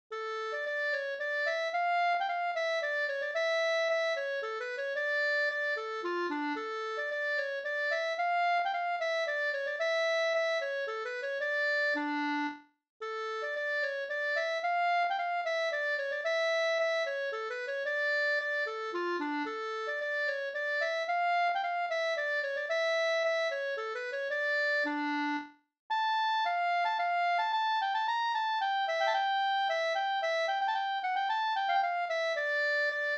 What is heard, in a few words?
polska